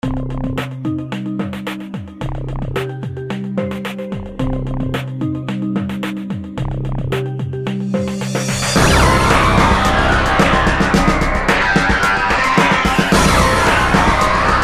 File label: drummy